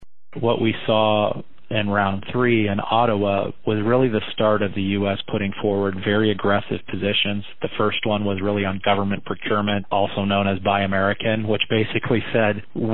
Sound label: Tone